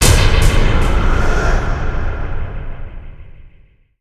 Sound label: jungle dunk success; weapons; dota; axe